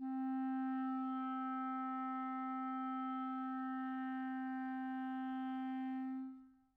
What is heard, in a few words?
clarinet; interpolator